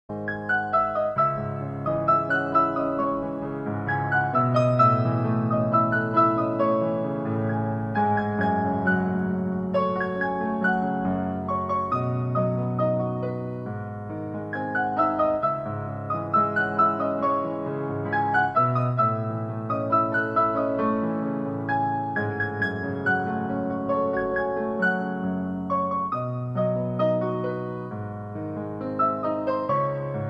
piano instrumental ringtone, telugu new ringtone sad bgm